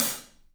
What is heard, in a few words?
PAH14, 3D Drums, LITE PAIST H; 3D Sound